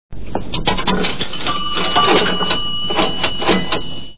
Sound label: phone pay dial